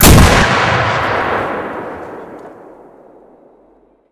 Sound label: Inf Weapons; Red Orchestra 41-45